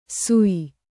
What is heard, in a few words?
"Suiiiiiiiiiiiiiiiiiiiiiiiiiiiiiiiiiiiiiiiiiiiiiiii"